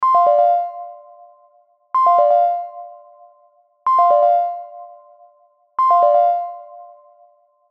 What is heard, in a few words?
ringtone